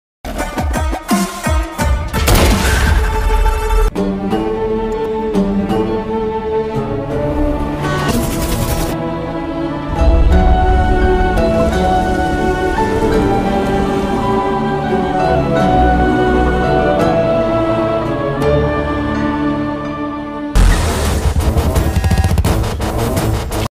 bgm ringtone telugu bgm ringtone mobile ringtone; shekar movie

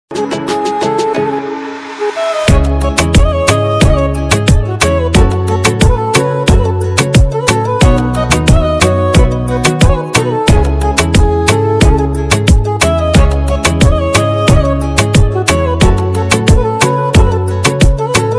oriental ringtone instrumental ringtone; ultra beats

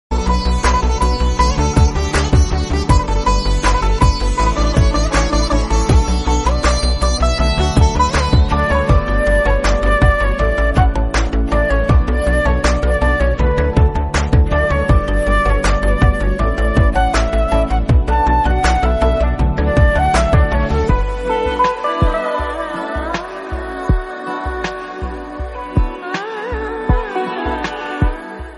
movie song background music ringtone bgm ringtone; taxiwala movie

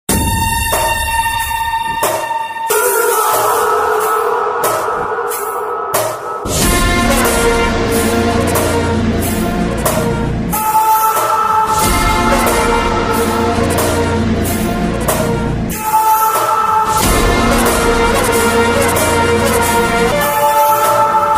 villain bgm ringtone; bheemla nayak; daniel shekar

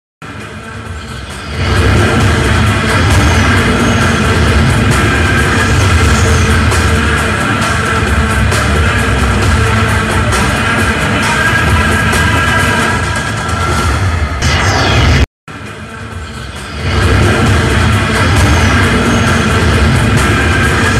interval bgm ringtone; operation valentine

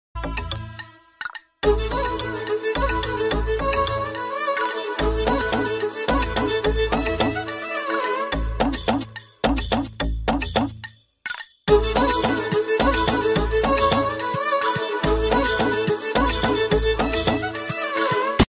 mouth organ bgm; mrugaraju; chiranjeevi

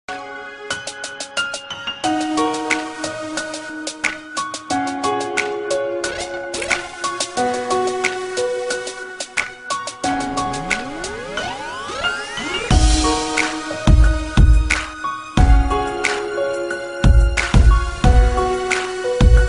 piano instrumental romantic

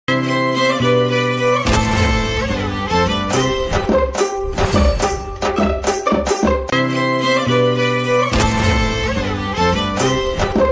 trailer bgm ring tone; Good Luck Sakhi; Keerthi Suresh

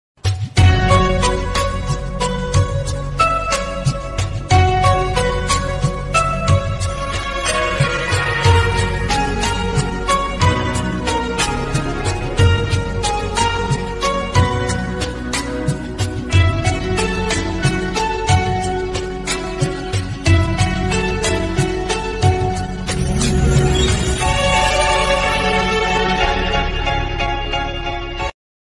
love bgm ringtone, tamil bgm ringtone; Kushi

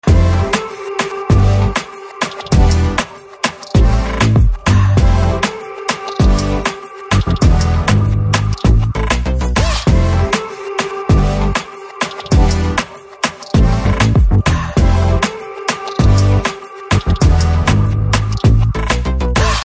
BGM Ringtone; Chakra

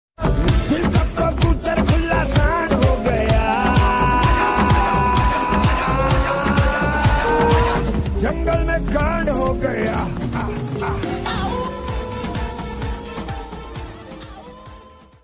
instrumental ringtone; bhediya; varun dhawan

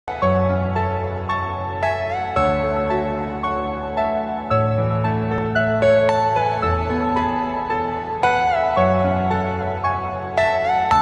sad instrumental phone ringtone

